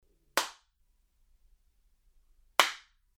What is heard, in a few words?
Test DeVerberate Clap